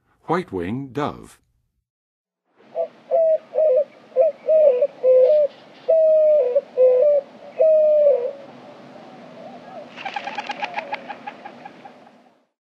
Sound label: White-winged Dove, Birds of North America; Western USA; Western USA Songbirds